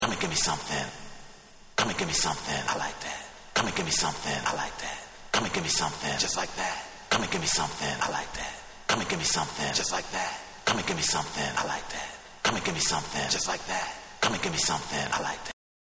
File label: Wet Acappella